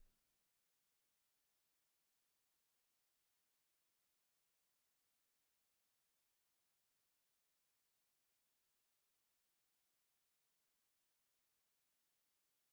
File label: suffocated bell